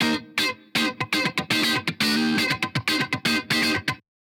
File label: funk guitar drive; modular effect board; sonic instruments